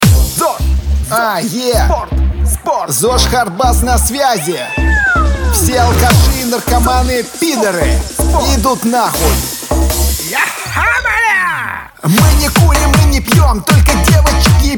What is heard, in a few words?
hard bass